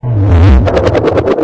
alert, strider; scmod

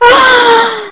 aah

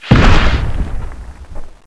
tyrant body hit

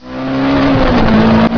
truck engage

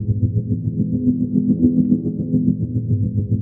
tunnel ambient

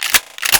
shotgun cock, weapons; hl2

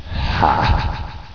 laugh

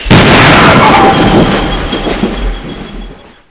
Missile hit

